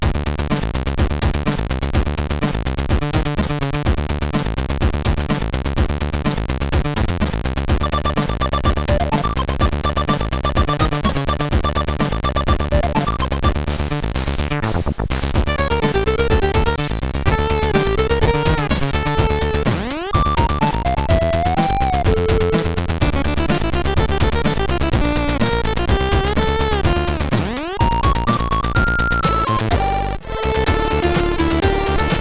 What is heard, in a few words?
Cutoff Skating, sid CSG8580R5